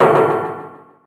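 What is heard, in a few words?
Handegg damage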